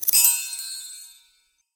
pendant off; Golden Crayon Sounds

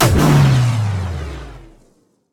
wp appear; Original TK Sounds

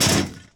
catwalk land; Golden Crayon Sounds

